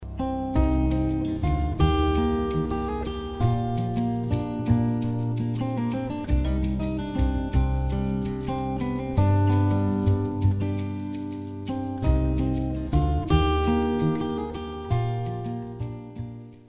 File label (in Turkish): santral fon muzigi